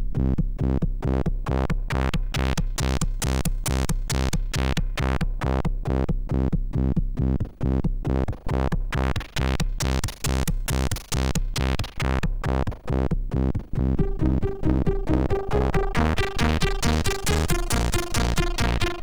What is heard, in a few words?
Loop, sid MOS6581R2; Unstable 92 Seconds